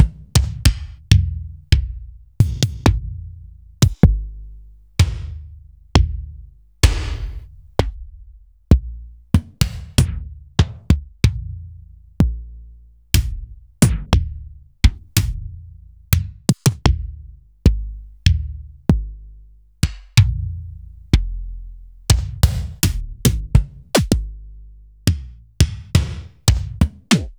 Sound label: Akai MPC500 Kick